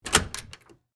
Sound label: Door Open Hotel, Foley, Door, Open, Hotel, Room; Locked